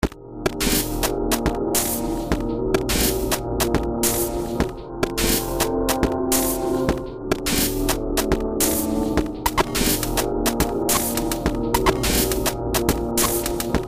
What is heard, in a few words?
glitch o rama; samplescience